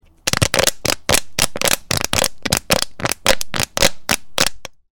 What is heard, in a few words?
bottle, Boing